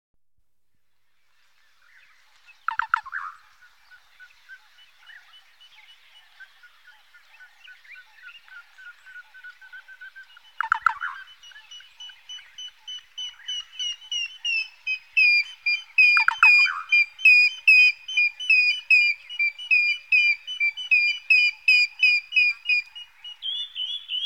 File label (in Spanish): las aves del bosque de acacias